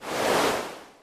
drag the cloth; operation

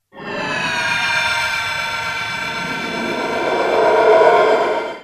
magic

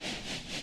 motion; animal

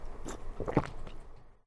gulp; animal

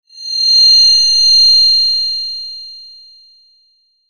ear noises; animal

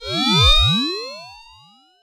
absorb

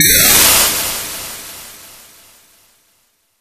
warp

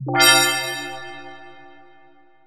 wipe

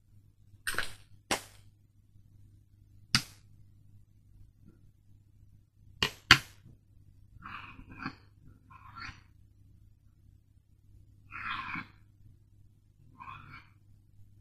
hit the hanger

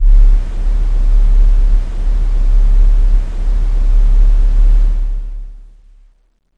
wind

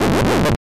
hit

